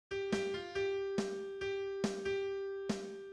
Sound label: opening phrase, mid